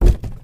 cardboard box impact soft; physics

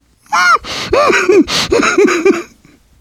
laughter, survivor voice

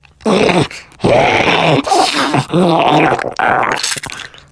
spitter lurk; voice, idle

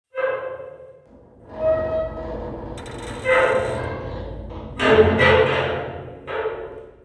creaking, ambient materials

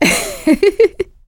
laughter; producer

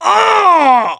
death scream, mechanic, survivor

